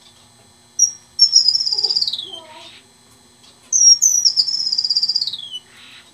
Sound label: Female Strawberry, finch; Birds